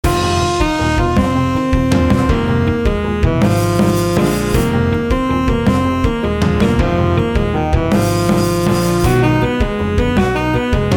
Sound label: sax verse